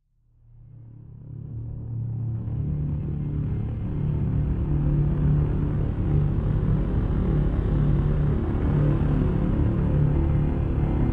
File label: Pizzaplex Ambiance; Five Nights at Freddy's; FNAF Security Breach